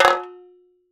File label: Chenda One Shots, Percussion; Sample Banks